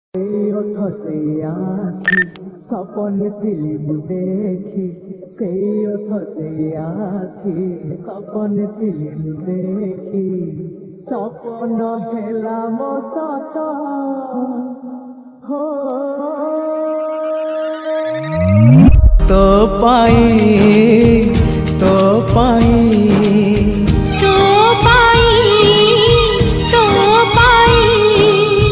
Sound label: Odia Jagannath bhajan